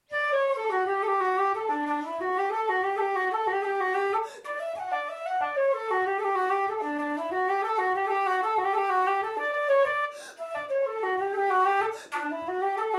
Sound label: DADGAD Guitar Accompaniment; MJ McMahon